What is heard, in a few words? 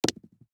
DICE on Felt, Throw and Roll, Standard, 3 Three Dice; Samples; ArticulatedSounds; DICE Sfx Party (Extended)